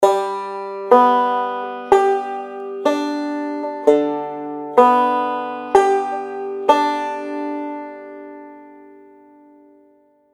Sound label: Alternating Thumb Roll